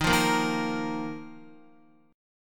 D sharp Suspended 2nd, Guitar; strummed; JGuitar

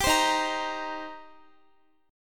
Eb 6th Eb, Guitar; strummed; x,x,17,17,15,17

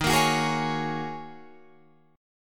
Eb Minor 11th Eb down Guitar Drop D; Ebm11 strummed

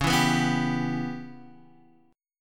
Db Minor 7th Flat 5th Db down Guitar DADGAD

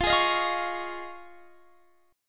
F Minor 7th Sharp 5th, Guitar, Drop D

